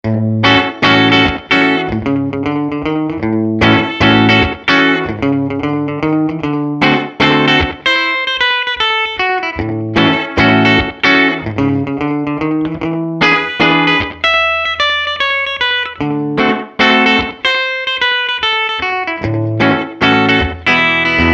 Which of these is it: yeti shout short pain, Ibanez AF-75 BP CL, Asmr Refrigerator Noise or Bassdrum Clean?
Ibanez AF-75 BP CL